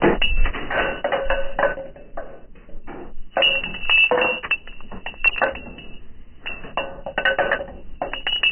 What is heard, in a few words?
Heavy Chains Loop; Industrial; ravenswood-seal